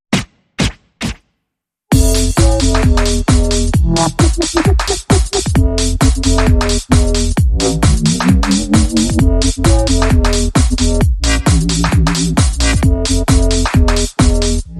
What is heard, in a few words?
Drums and Bass